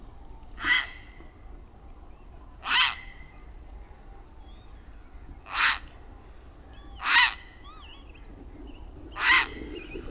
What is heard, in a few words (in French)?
Heron cendre Ardea cinerea